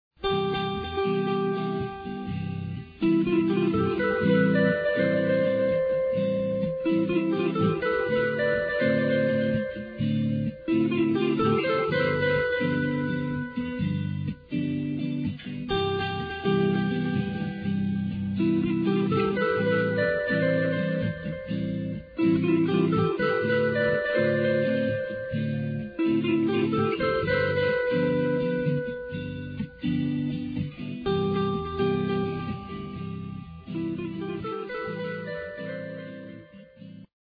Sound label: echo